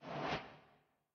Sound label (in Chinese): 提示框弹出声